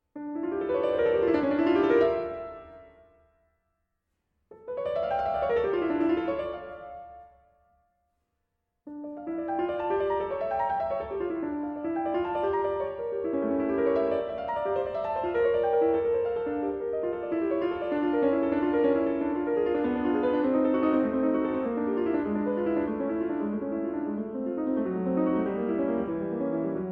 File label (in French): Fantaisie Chromatique en Ré Mineur; BWV 903